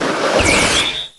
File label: Ice Elemental Shoot Sound; Heroes3